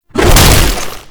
Infested Ancient Small Arm Swing; Warframe

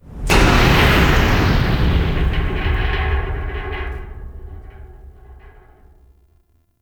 Void Storm Hull Impact; Ambience; Warframe; The New War; Zariman

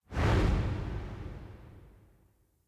Player Aim Jump Start

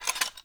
Foley Action Metallic Punch; Arm

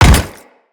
Step Default; Grineer Leto

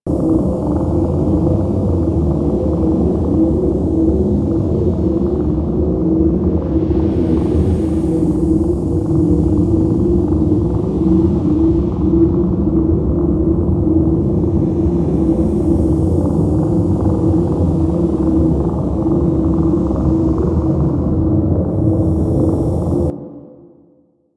Interior Drone; Warframe; Corpus

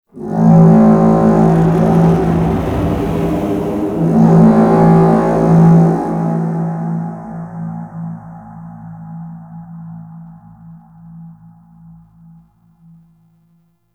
Ship Roar Intro; Deadlock Protocol; Cinematics

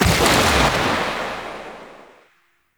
Shot Alt, Rifle; Warframe; Weapons; Thanotech; Trumna Prime

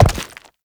Step Rock; Warframe; Uriel; Movement; Steps

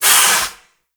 Forest Water Pump Engage; Props; Warframe; Grineer Forest

